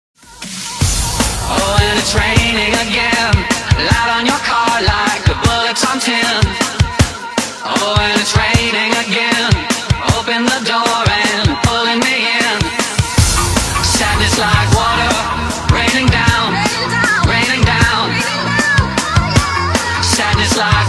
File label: Raining Down, uk bounce